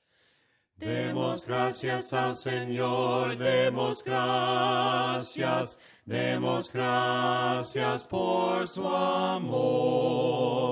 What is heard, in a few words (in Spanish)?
DEMOS GRACIAS VOCES, himnos a capella; cantos del camino